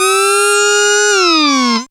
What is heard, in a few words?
fx C#, Rave FX, Dance Synths